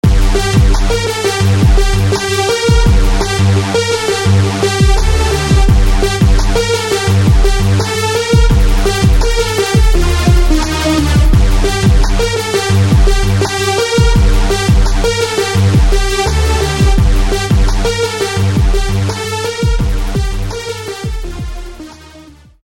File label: Crunkest Saw